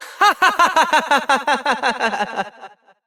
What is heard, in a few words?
Laughter; Evil Twin B; Warframe; Entrati Doppelganger; Battle Barks